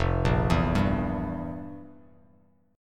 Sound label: F Major 7th Suspended 2nd Suspended 4th, F, down, Bass Standard; FM7sus2sus4 arpeggiated; JGuitar